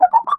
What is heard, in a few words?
retreat; fowl-play; timmy; enemies